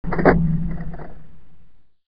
engine die

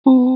owl hoo

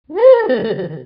Laugh; Gario

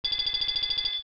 Bell school

